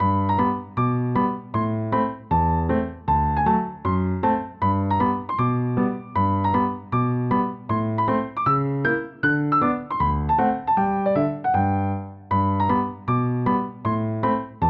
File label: kawaii piano